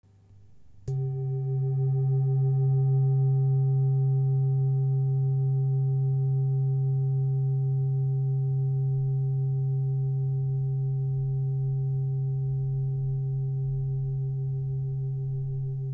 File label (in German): Lotus tiefer Ton